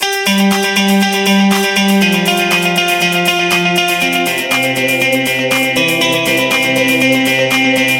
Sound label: Penny Arcade, ringtones; Samsung SGH-T839-Sidekick-4G